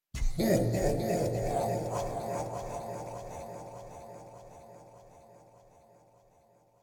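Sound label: Nightmare laugh; Super Mombo Quest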